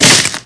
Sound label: frost diver